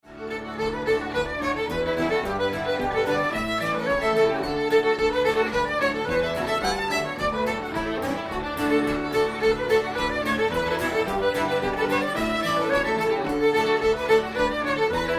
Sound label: Jig; Rons Jig